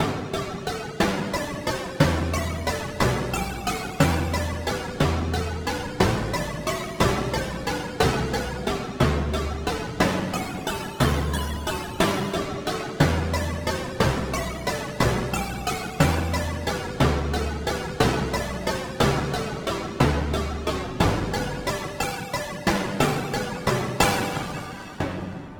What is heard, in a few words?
timpani